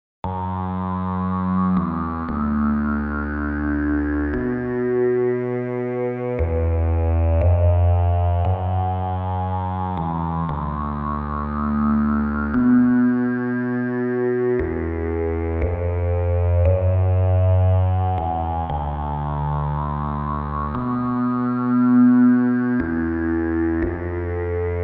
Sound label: SYNTH